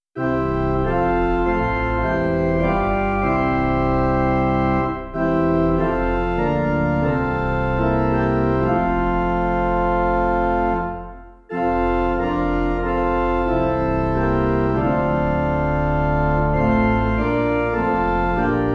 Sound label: Pipe